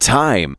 vox; Ueberschall; Techno Trance Essentials

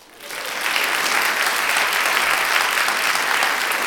CLAPPING; AKAI S6000 CD-ROM - Ultimate

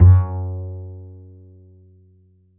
D string upright bass; Upright Acoustic Bass

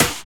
BURSTY SNR, TECHNO; Drums In Your FACE!

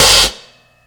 Open Scratch Hi-Hat; Drum n Bass; Hi-Hat One-Shots; KJ Sawka; Drum n Bass & Beyond; Drum Tool Box

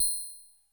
HI-TRI, PERCUSSION; Crypton - DrumBase5000; PERCUSSION#1

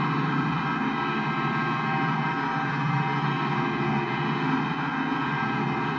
Panned Organ, non tempo loops

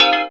Klang Key F7; Proteus 2000; Digital Sound Factory Proteus 2000

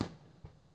PERC COMBI; Crypton; DrumBase5000

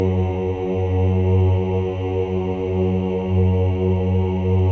CHOIR OOS, F#2; Spectrasonics; Symphony of Voices

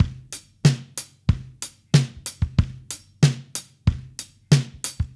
Mixed Berry Jam Drums, 70s Analog Funk

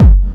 BD, Dance Drums

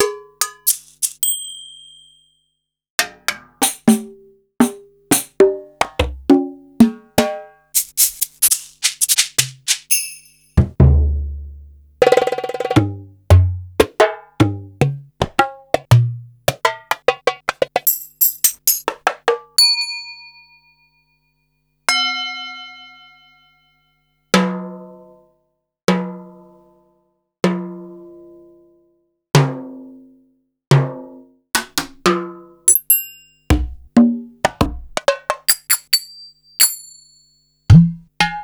Yamaha Motif ES-Series

